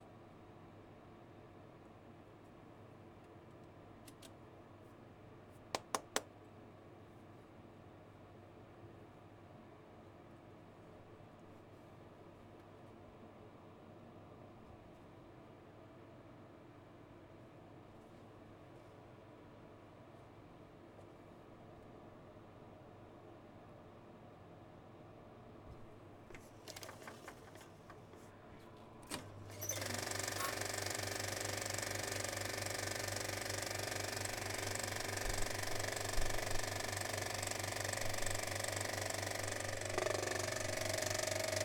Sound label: Projector Philips